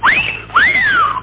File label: cat call